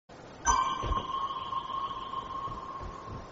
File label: prop hunt ping; fortnite